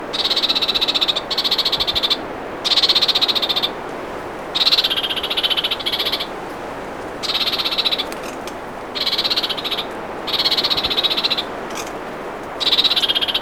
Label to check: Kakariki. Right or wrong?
Right